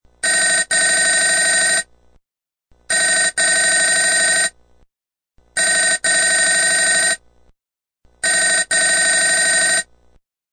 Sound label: Four successive rings on a telephone.; Telephones; Electronics